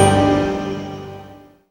GLASSTA; NorthStar - The Wizard VOL-2; THE VOID

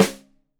Rim Shot Stereo, drums

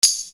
Hat, EuroDance; Best Service; Ethnic Journey Sound Cube; EuroDance Essentials

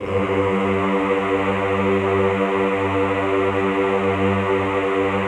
EHH G1, Classical Choir; Best Service; Extended Classical Choir; EHH LO+HI LL

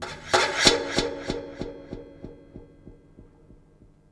Delayed, Wire & Tin, contact mic; musicradar; contact mic madness

